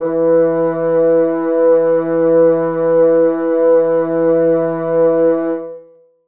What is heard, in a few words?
bassoons sus e3; Sonatina Symphonic Orchestra